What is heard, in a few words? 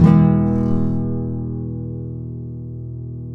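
nylon down major F; FLAM CHORDS; Guitars; Spectrasonics; Hans Zimmer Guitars VOL-2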